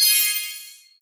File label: Skillup